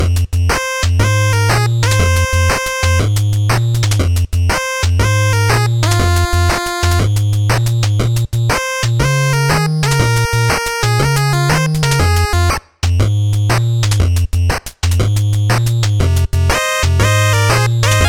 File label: 8bit Ingame; hill climb racing; android